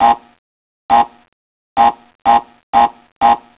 tnt

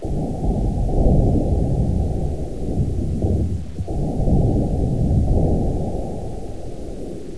battle

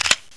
shotgun cock (double shot)

